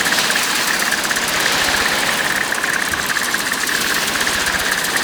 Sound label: Sniper Alt Fire Proj Loop; DE; Warframe; Frumentarius; Exalted Sniper